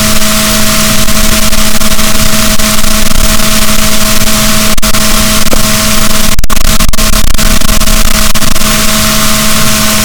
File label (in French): rorquals, boat, Balaenoptera Physalus; SNR 6dB